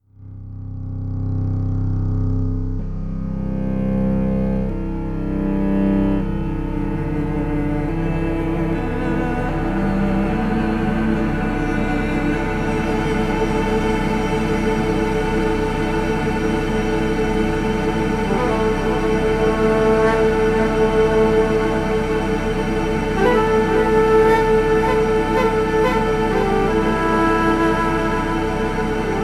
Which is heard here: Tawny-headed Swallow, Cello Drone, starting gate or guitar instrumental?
Cello Drone